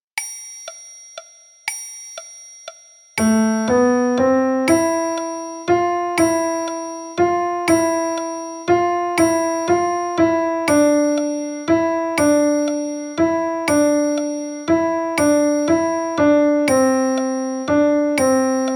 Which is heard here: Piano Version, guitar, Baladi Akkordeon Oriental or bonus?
Piano Version